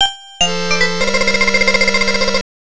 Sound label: Round Start Music; Pac-Land; TurboGrafx-16